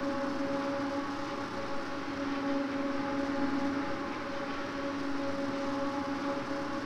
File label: Traction blower ext, Train; OpenBVE; Cl313 Silverlink